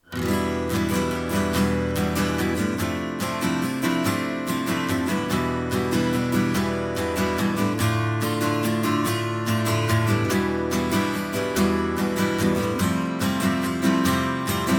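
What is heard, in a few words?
phrygian ambient; fretjam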